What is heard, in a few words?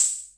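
hammond auto vari 64 hi-hat; 1974; drum machine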